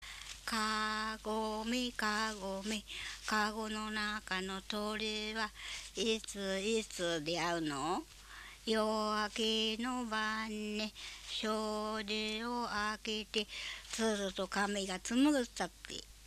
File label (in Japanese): kagomekagome